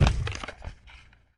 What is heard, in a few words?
Cling To Player; Lethal Company